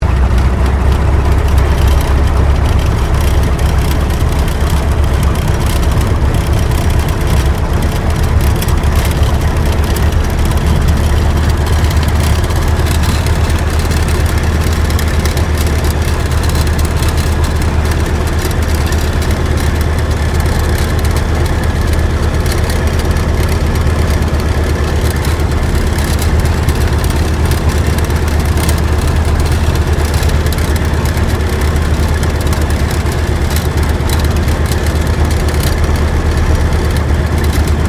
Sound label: AIRCRAFT T-28D TROJAN TAXI INTERIOR; Airplanes; Vehicles